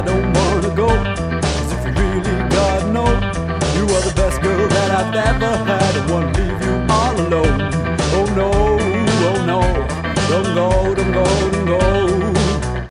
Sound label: Rock, stem; Music Delta